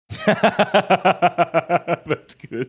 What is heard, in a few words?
laughing saying that's good